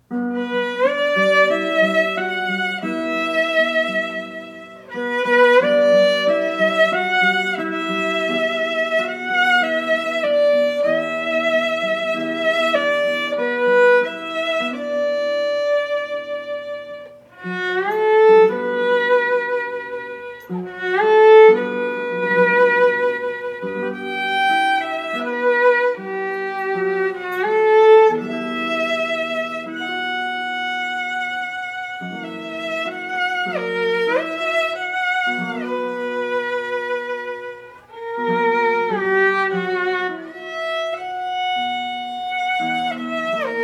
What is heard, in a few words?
guitar; Guitar & Violin